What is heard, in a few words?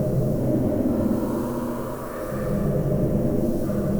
Sample n Hold Verb Loops, sparse soundscape